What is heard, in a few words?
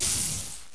door slide